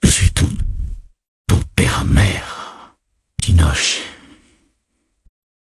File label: jingle